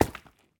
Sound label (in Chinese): 珊瑚踩踏声